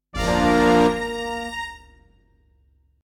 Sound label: Chord